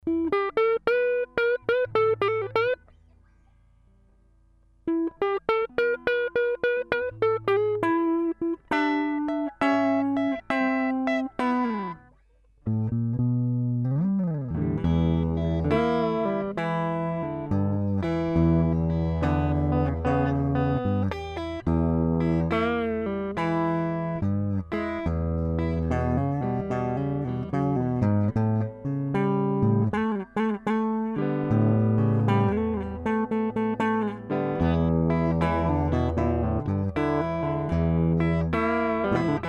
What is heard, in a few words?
LP Custom Neck